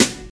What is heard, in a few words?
00s studio snare g sharp key; snare drum samples